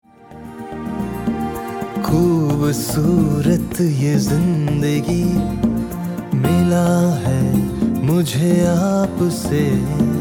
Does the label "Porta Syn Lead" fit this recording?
No